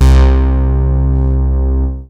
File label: BASS C2; Q Up Arts; Dream Experience